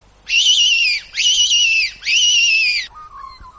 fox sound

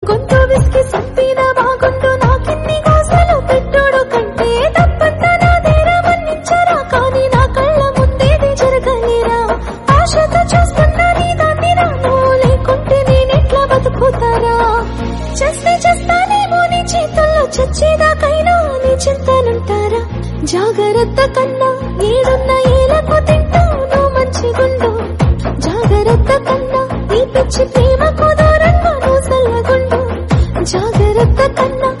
telugu song

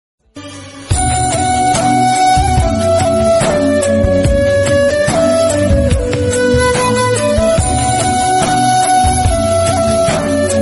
song bgm

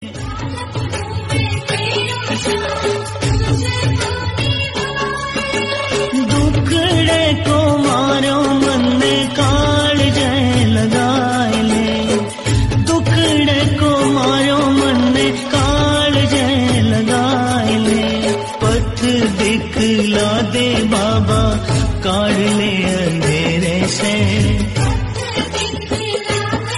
devotional bhakti hindi; jai shree shyam baba

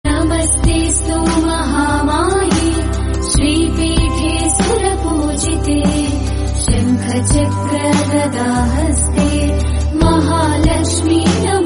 devotional

